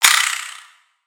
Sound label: Spider Get Hit